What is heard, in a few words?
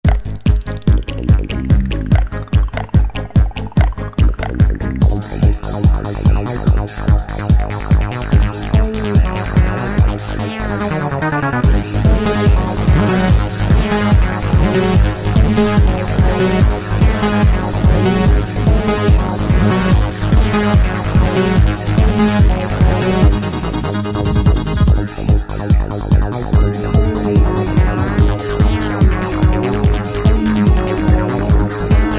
Goa Trance; Preset Styles